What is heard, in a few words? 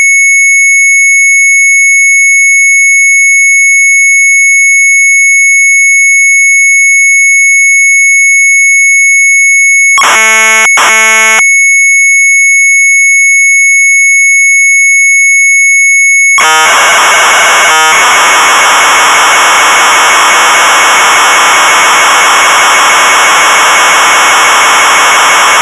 MZ800 Tape; retromelsarchive; sharp mz800